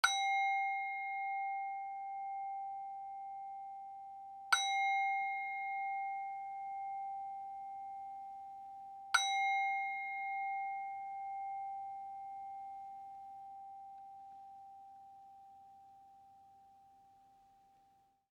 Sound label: Meditation Timer Bell